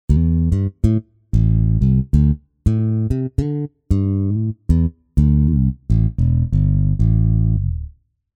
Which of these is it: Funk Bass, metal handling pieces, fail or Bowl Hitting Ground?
Funk Bass